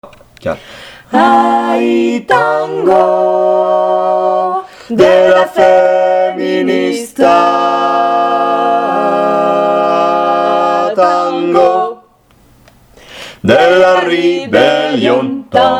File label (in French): tango refrain tutti